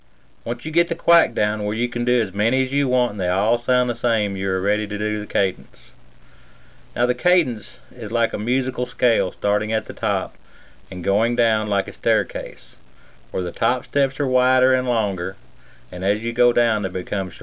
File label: cadence